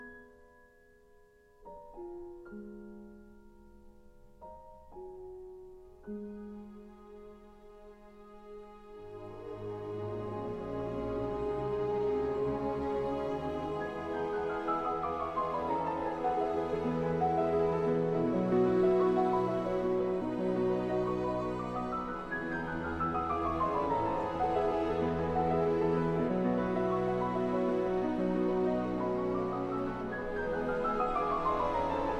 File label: Piano Concerto No 1 in E minor Larghetto; Op 11